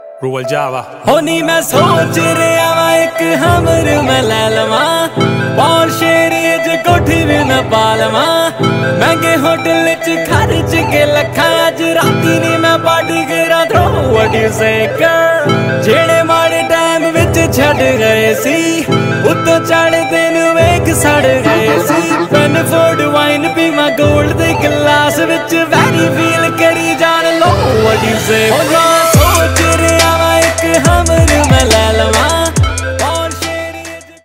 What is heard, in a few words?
Punjabi Cool Song Ringtones